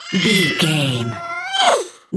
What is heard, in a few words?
goblin fairy effort push